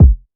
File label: Kicks